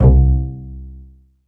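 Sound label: E-Mu Proteus FX Pizzicato Bass C2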